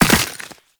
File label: Land Soft Ice, Footsteps; Characters; Operator